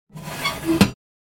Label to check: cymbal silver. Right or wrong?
Wrong